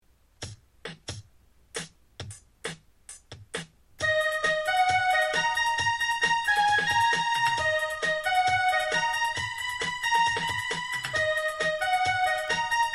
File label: theme music basic melody; arbor rangers